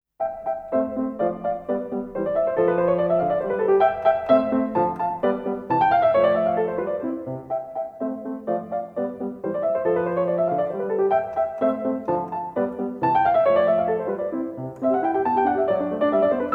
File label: Piano Sonata; Mozart; Piano Sonata No16 K545; Katchen